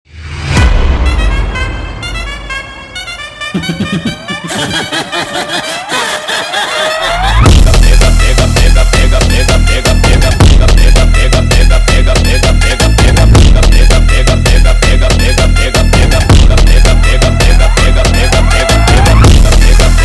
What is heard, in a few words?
sped Up